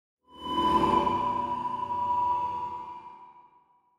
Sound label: Offering Unhappy